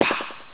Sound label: rocket